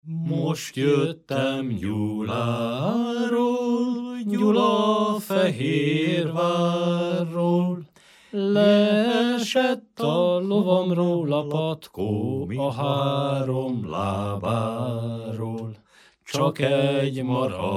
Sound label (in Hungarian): nepdal; most jöttem Gyuláról